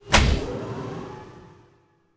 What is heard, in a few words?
door; Barotrauma; Subsurface